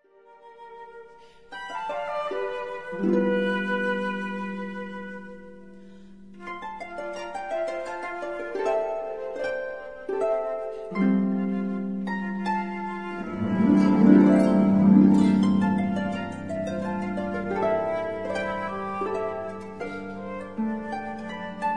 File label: Duo For Flute and Harp